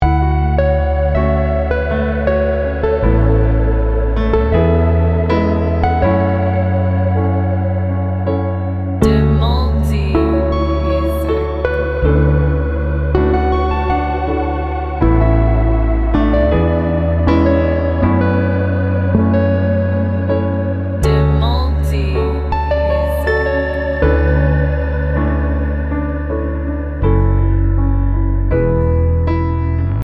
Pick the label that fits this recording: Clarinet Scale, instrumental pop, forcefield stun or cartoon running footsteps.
instrumental pop